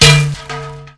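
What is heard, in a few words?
grass; Mafie 1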